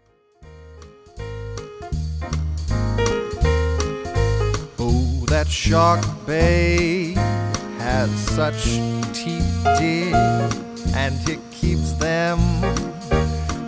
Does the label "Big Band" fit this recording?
Yes